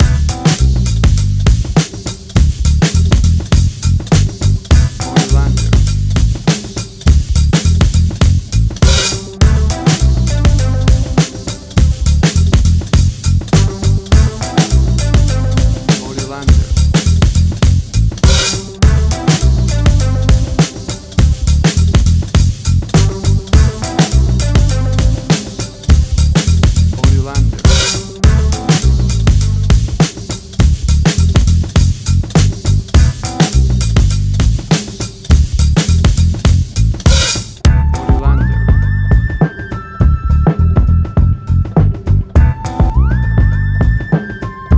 90s Hip Hop

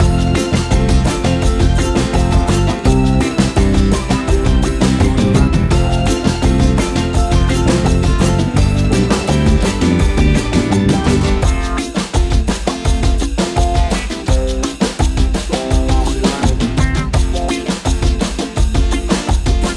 Calypso Summer Holiday Island Music

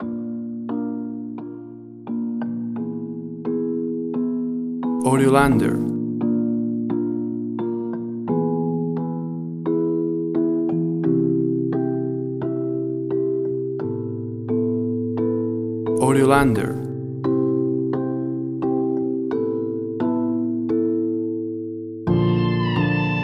Game of Souls 87bpm

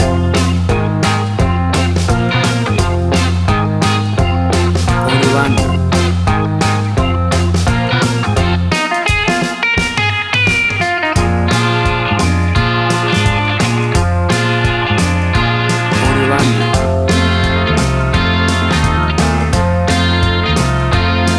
60s Mystery Man

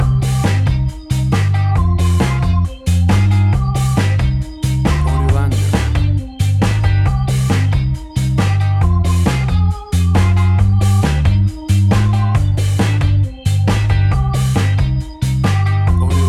Cool Ska Reggae Carribean Music

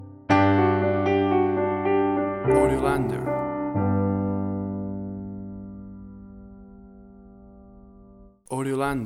Adult Sitcom Piano Link

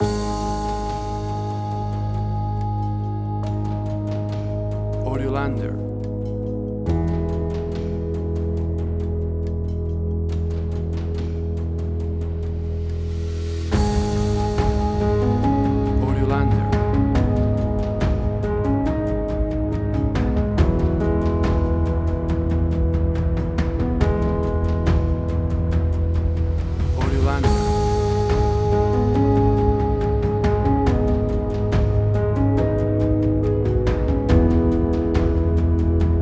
Atmospheric Slow Tension; 60 SEC; AUDIOLANDER

